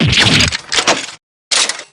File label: damage; drebber